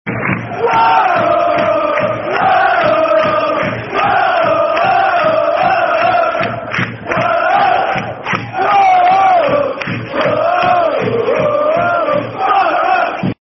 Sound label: Oh Oh Oh Oh Oh Oh Oh Oh Borough [Football Chant (Soccer Song)], Peterborough; Peterborough United Pufc